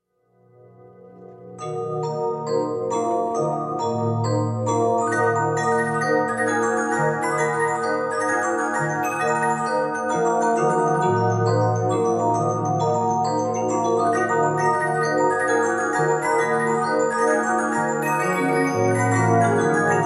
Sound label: March Of The Toys